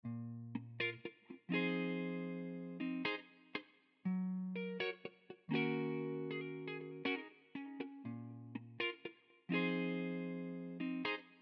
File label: music